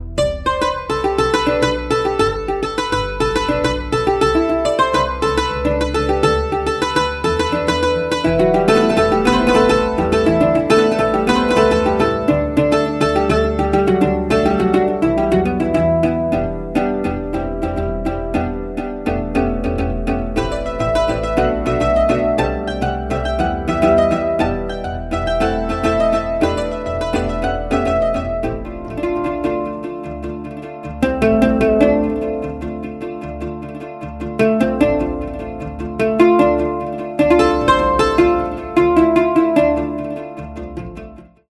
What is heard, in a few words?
mandola